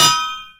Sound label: anvil landing; minecraft